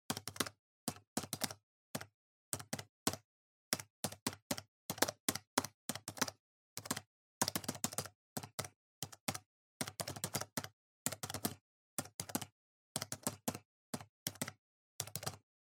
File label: PC Keyboard Mid